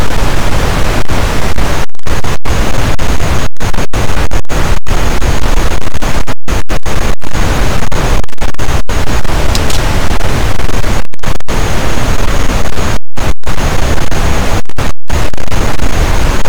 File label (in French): cachalot positif, boat, Physeter Macrocephalus